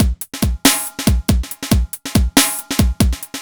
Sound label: Synth Loop BPM140